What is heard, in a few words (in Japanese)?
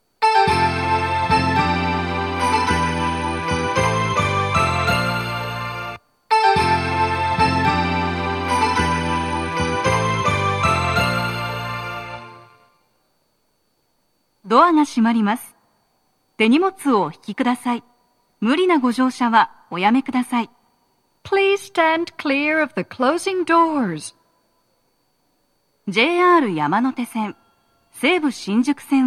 発車サイン音, 音量は大きめです; 高田馬場; 1番線 西船橋・東葉勝田台・津田沼方面